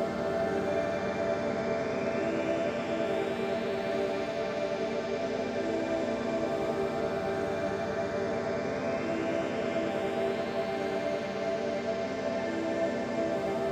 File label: Boss Intro Pad